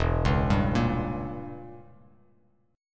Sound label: E Diminished 7th E, Bass Standard; Edim7 arpeggiated; JGuitar